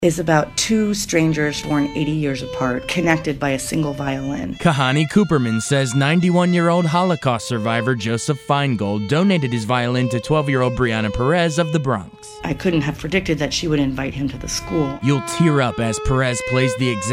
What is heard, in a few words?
VIOLIN